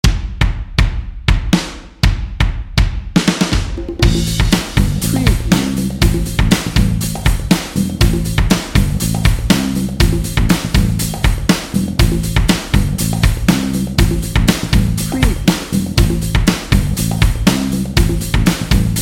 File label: funky and fun no guitar